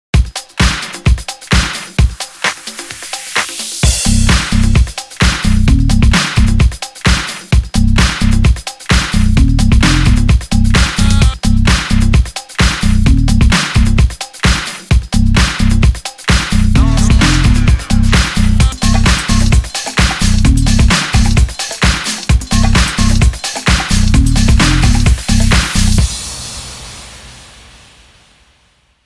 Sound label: HARDROCK SHUFFLE